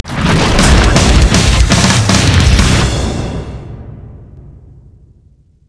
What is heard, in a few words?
level up